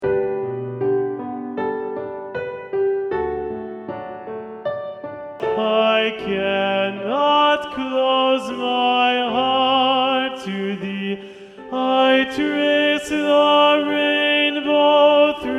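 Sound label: Tenor Predominant, choir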